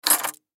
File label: Key Ring Pick Up; Key Ring Pick Up Fast Table; Pick Ups; Foley